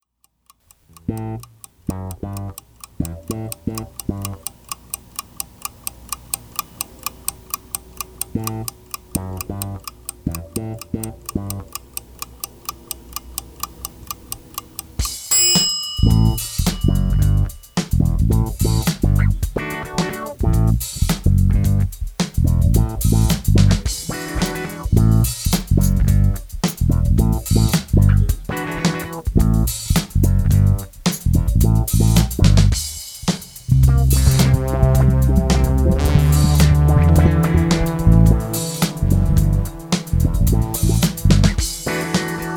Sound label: Funk in a Box